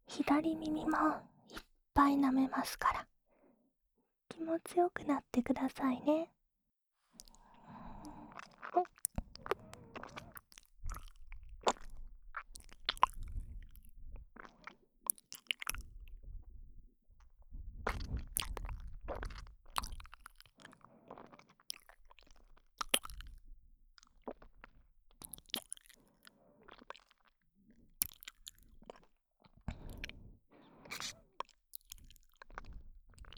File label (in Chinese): 舔耳循环 (左耳); 魔族×按摩×世界征服 ～芭丝特托令人心跳加速的隐藏服务～